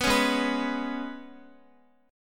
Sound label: Ab Minor Double Flat 5th down Guitar; Abmbb5; strummed